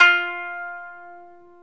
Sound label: ETH KANUN, Eth Plucks, Saz & Kanun